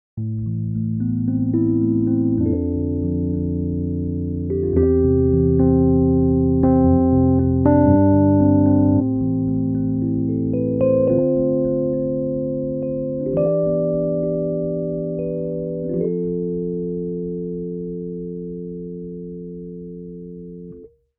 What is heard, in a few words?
Rhodes V8 Deep Voicing